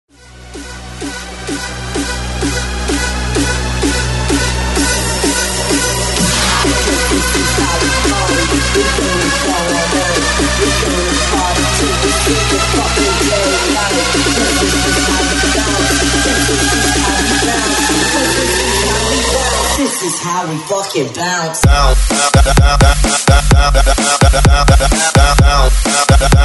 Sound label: break mix, 130